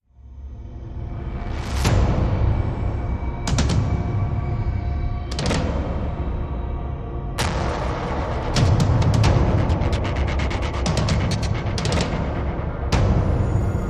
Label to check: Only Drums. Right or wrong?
Right